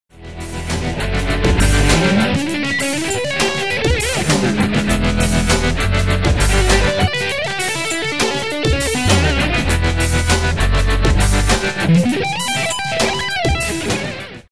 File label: break